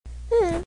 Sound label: hmm